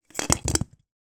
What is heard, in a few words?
foley baubles decorations christmas x4 drop carpet; zapsplat